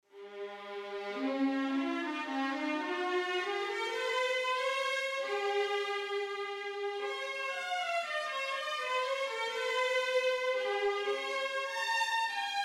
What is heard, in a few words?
Strings; Nucleus